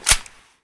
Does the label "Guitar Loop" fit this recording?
No